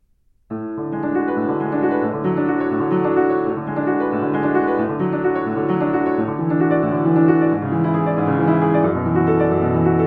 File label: Prelude; New Monticello; albumleaf 127a